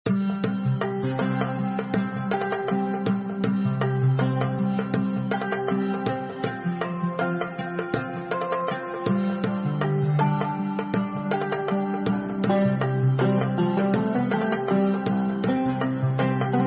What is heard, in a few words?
music Sheikh Room